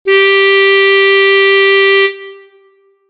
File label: clarinet